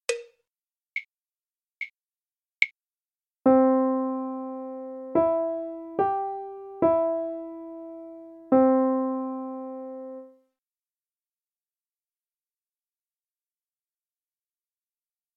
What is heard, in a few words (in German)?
Melodie